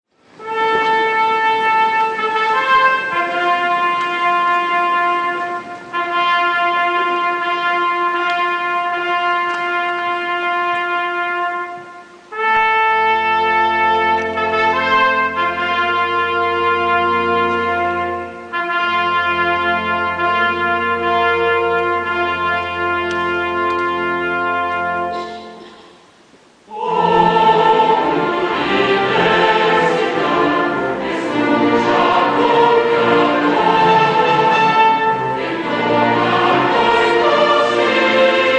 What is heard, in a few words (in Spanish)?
himno deportivo